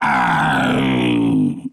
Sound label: Harbinger Death